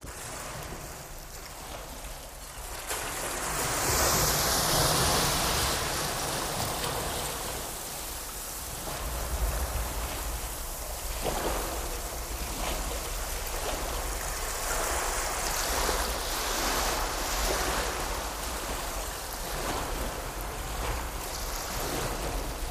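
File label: Water Movement Bow Wash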